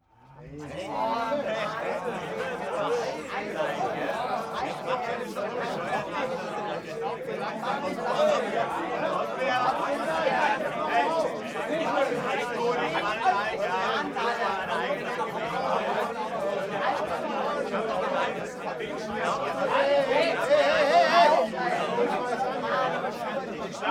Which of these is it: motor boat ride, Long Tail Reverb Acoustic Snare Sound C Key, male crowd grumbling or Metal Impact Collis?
male crowd grumbling